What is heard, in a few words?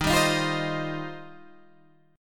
D sharp 6th Add 9th D sharp Guitar Standard; D#6add9 strummed